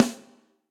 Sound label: Snare Hit & Rim Bright Room; Drum Hits; Ableton; Factory Packs; Session Drums Studio; MPE Hits